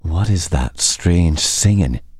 what is that strange singing

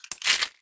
Pickup Ammo; Gameplay Wpn

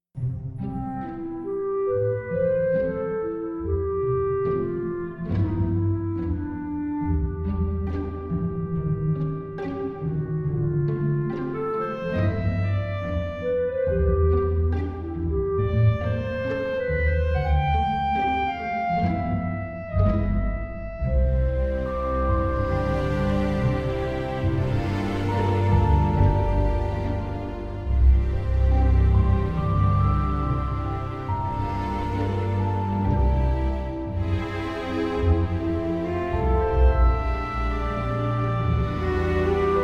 harmonic relaxed love ending; Echoes of Aetheria

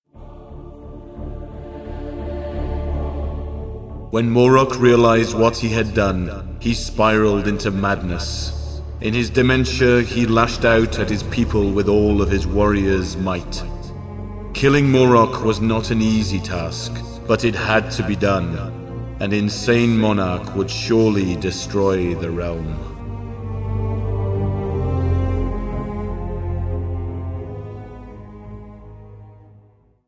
Mountain Clan Win; Disciples II Dark Prophecy Gold Edition

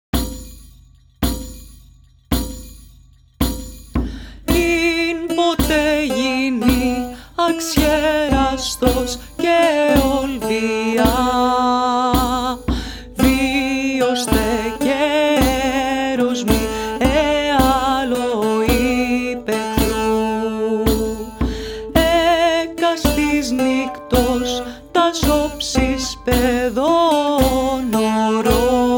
Greek Sea Shanty Version; Assassin's Creed Odyssey; World Music Sea Shanties Edition; Myrinne

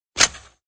Equip; Shop Empire 3

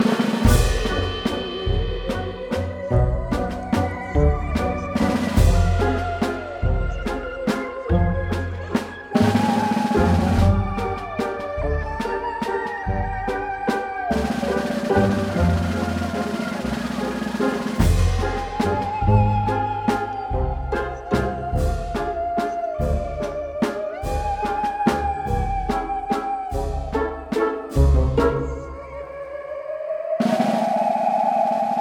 Magicians Music

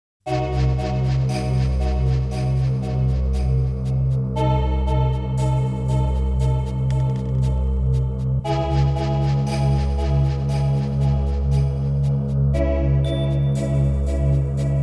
Ominous; front mission original sound version

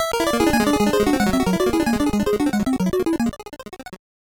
Boss Period Start; Robo Warrior; NES

